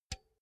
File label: pull out cinch quick; objekte; elektronik